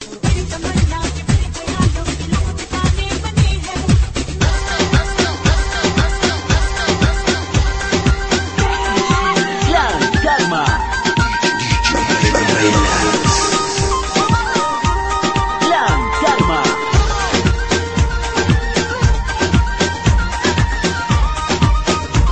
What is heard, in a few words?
Arabic Hindi